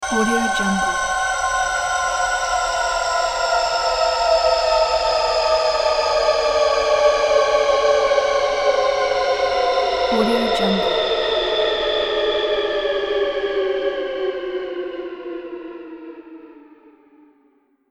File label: halloween ending